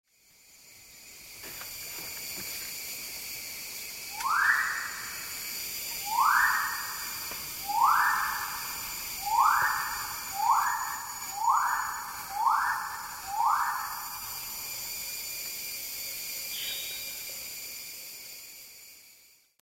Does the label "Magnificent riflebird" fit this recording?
Yes